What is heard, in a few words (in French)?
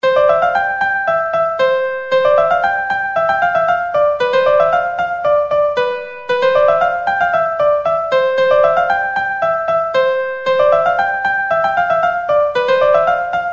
Melodie